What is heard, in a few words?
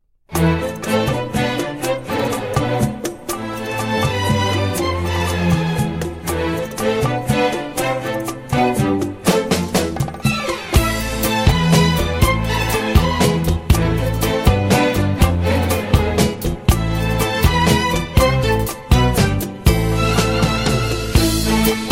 oriental; World Cup